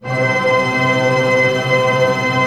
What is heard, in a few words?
TREM F# PP, ORCH TREM; Sound On Sound; Peter Siedleczek's Orchestra